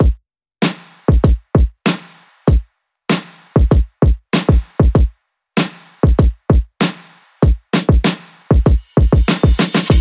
Drums and Synth Pulses Only

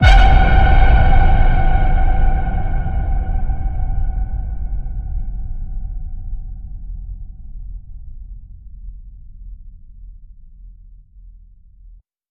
Behind The Ping, F sharp

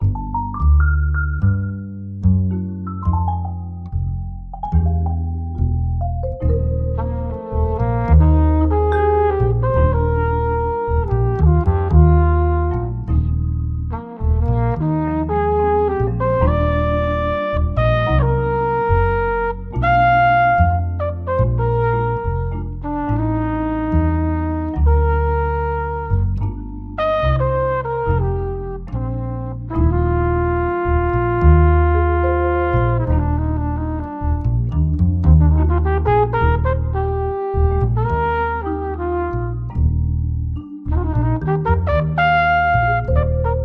With Horn

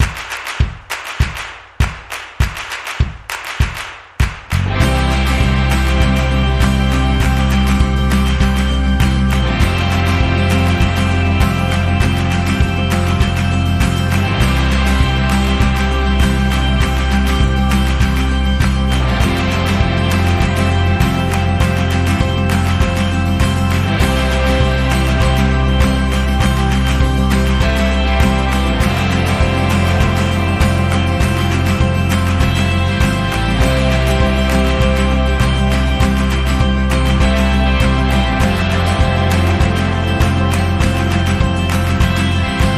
No Vocals

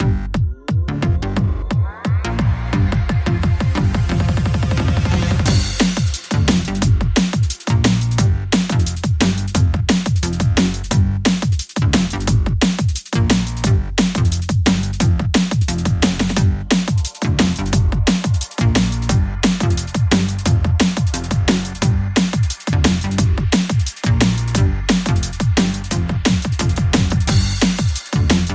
No Synth

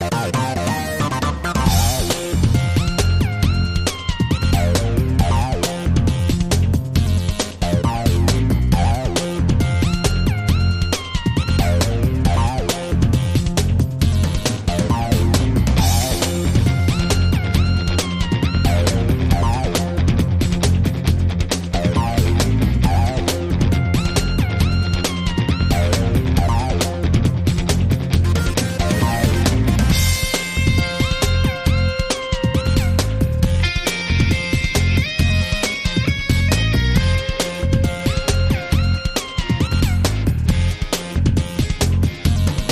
Breaking News Bumper

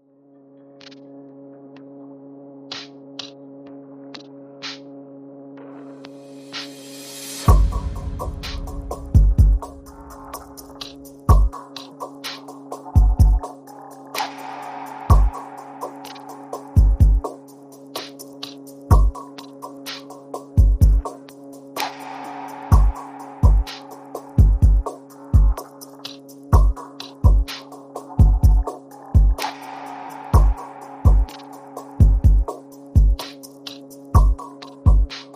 Sunset Park, Perc Pads and FX

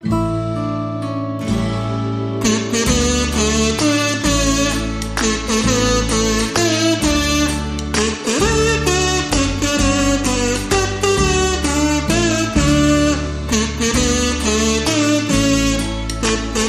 Kazoo Happy Birthday

